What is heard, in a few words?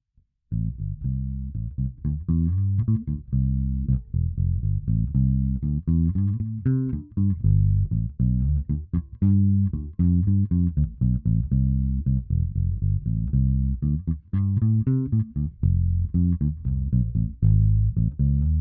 Bass